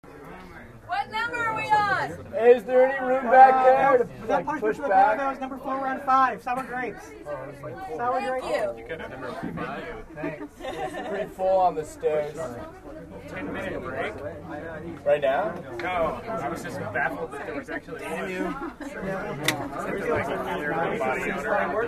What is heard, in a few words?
chatter